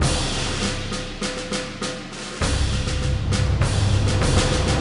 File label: Battle Intro; Trenches 2